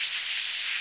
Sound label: spray